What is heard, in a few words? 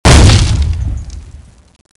zombi stamper iron maiden stamping